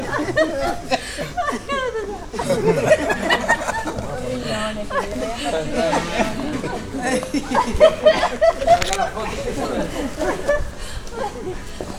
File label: chatter, tavern; Loops